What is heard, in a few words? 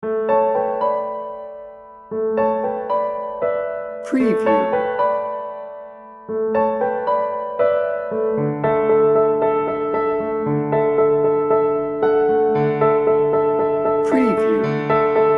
breezy summer day